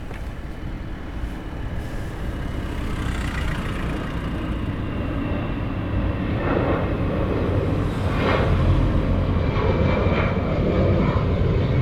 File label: Plane Taking Off